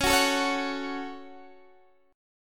Db Suspended 4th Sharp 5th; strummed; x,16,16,14,14,14

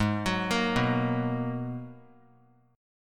G sharp Minor 9th G sharp; G#m9 arpeggiated; 20,25,25,21; JGuitar

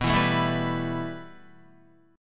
B 7th Suspended 4th Sharp 5th, Guitar; 6,7,9,9,7,7; strummed; JGuitar

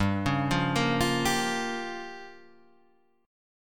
G Minor 7th Flat 5th, Guitar Half Step Down

